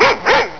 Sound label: dog bark; Pro Android Games; Wolf3D